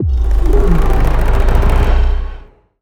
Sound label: near win